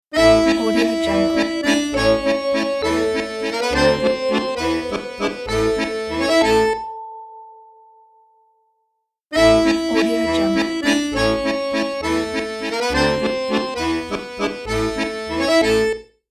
accordion german logo

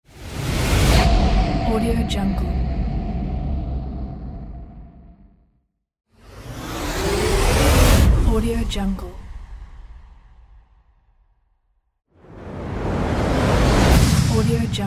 short risers

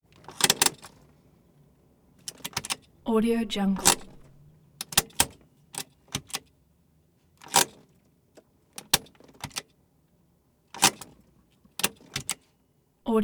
volkswagen rabbit parking break ratchet up

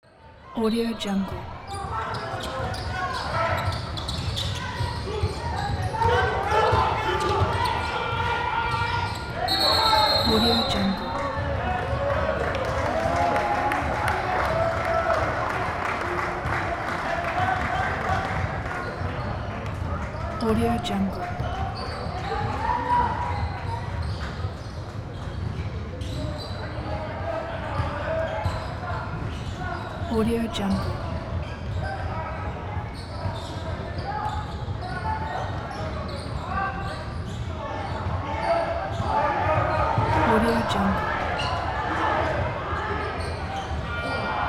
basketball tournament ambiance

